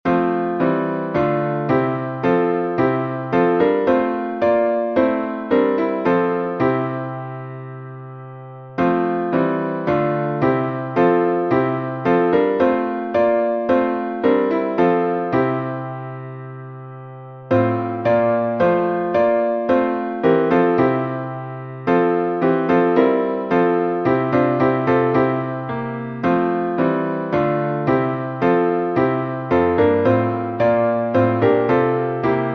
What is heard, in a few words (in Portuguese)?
salmo instrumental; salmos 8B